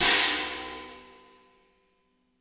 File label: CHINA, HI-HATS; Spectrasonics; Burning Grooves